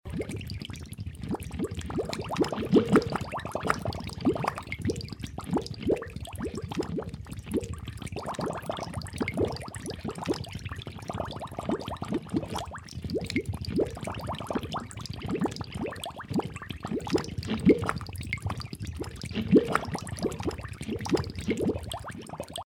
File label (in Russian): zvuk puzyrkov na poverhnosti